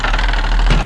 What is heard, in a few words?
handle